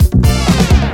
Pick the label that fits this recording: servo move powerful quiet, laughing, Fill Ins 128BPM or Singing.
Fill Ins 128BPM